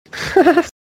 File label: hahaha